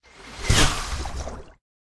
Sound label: Water Spirit